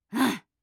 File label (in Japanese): あ゙ぁ。